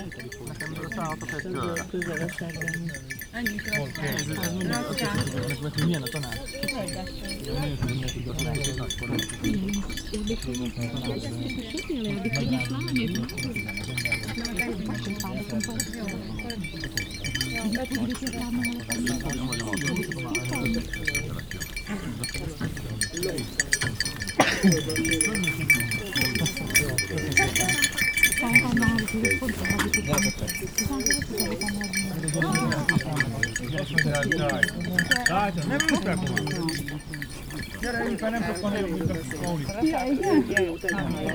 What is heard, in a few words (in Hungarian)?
pusztaotos hortobagyi puszta; elol 3 lo utana 2 az onalla hajto 1